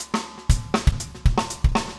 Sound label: funk 120 bpm, loops